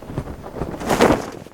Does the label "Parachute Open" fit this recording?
Yes